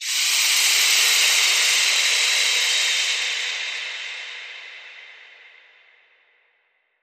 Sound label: Inhale Exhale Long Hush